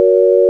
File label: sine